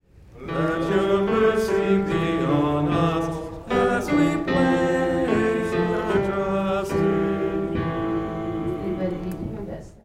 bass and tenor; Gather Hymnal

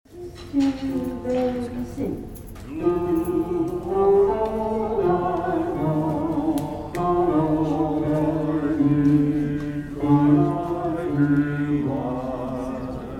bass; Gather Hymnal

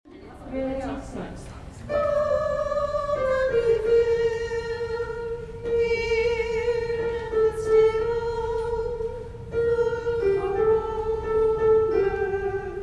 soprano

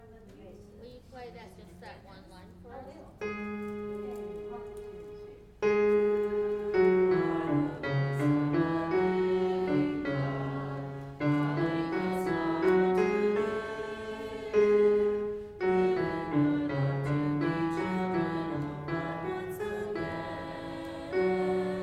alto and bass; Gather Hymnal

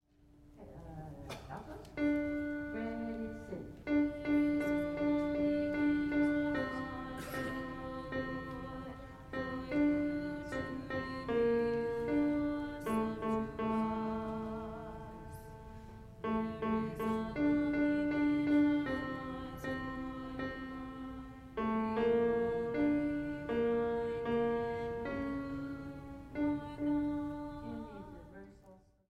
altos; Gather Hymnal

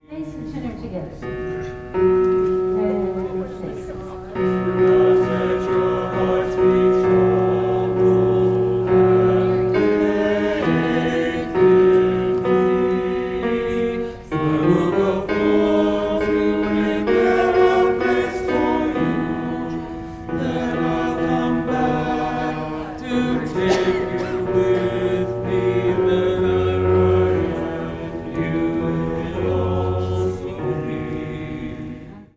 tenor and bass; Gather Hymnal

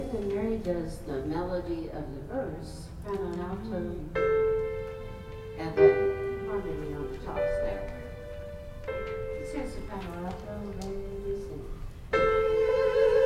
soprano and alto

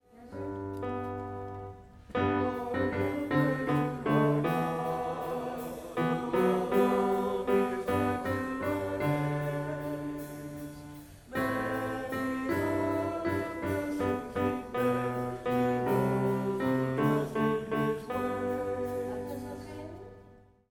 tenor and bass; Gather Hymnal

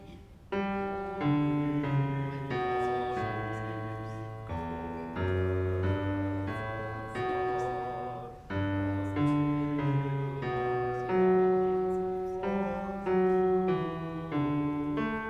bass; 902 O Breathe on Me O Breath of God; Gather Hymnal

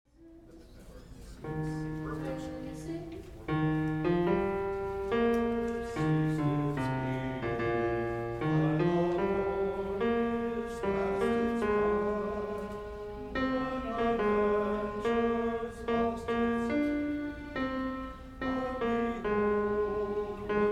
bass

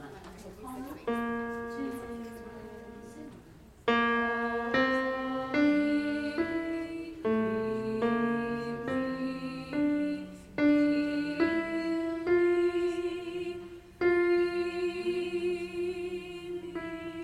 alto

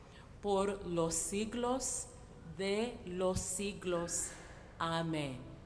Great Amen - Spanish words

